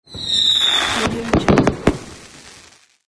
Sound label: firework launch